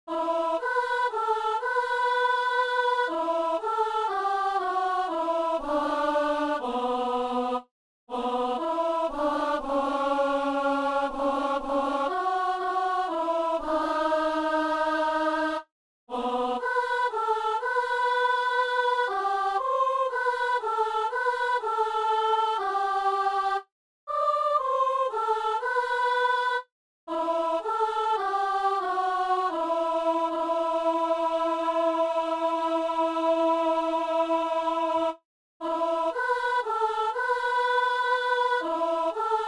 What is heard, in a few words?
soprano; Messe du 17 janvier à Saint-Denis